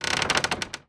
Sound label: Mummy Coffin Open; Playable Tutorial Level